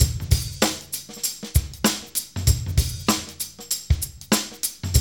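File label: 96 bpm, Funky Drums; AMG; Now CD-ROM (Roland); Neil Conti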